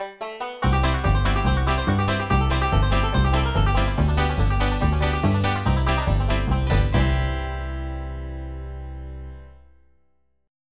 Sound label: PICKIN